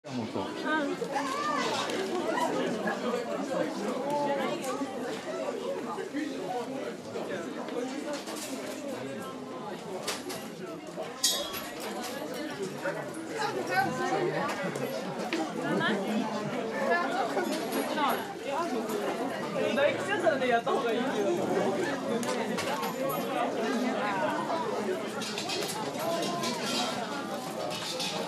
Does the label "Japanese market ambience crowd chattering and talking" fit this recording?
Yes